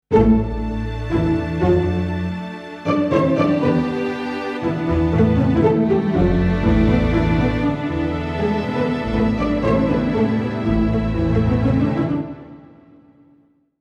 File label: Pizzicato; Pa2x